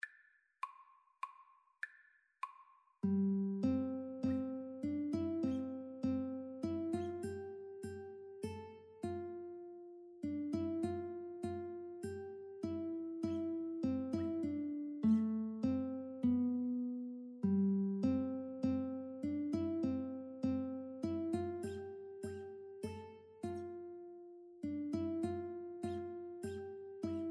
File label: away in a manger, Guitar Duet